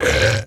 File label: hit; Deerclops